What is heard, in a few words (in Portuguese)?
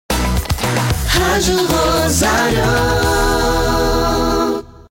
CURTA RADIO, vinhetas; shopping; ROSARIO